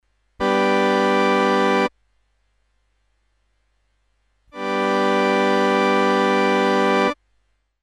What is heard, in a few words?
Cello viola violin attack variations